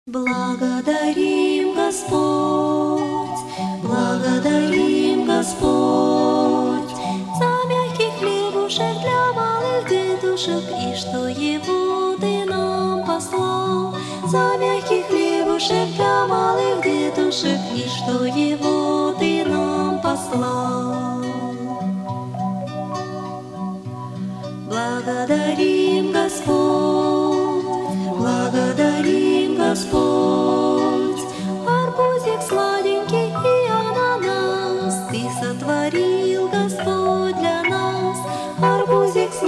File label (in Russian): Благодарим, Господь - детская; Песнь Возрождения